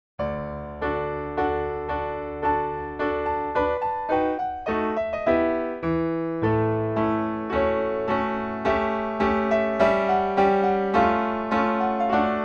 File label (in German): kleine stucke fur klavier lustiger tanz; drei kleine stucke fur klavier i lustiger tanz